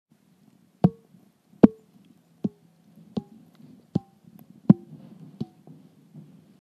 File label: Metallic Sound